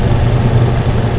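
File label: machine; quake2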